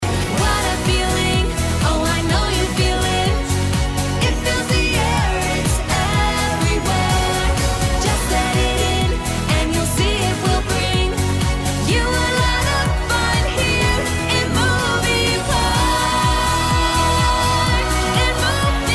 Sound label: Theme Song Session Vocals